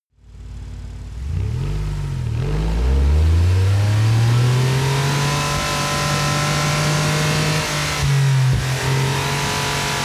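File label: sports car exterior engine high rpm unstable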